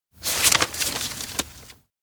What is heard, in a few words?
paper gather; anemoi